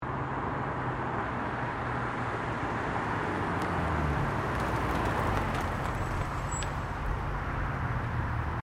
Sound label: Car Nissan 350Z Exhaust2 Pull Up, manual sportscar; Cars; Vehicles; SoundEffects-Foundation1